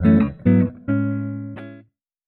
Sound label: jingle